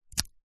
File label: household usb lead socket plug in plastic wall socket